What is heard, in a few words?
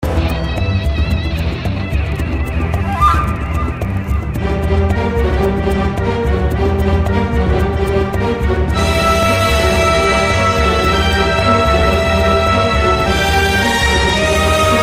Alert; Destroy All Humans Path of the Furon; Las Paradiso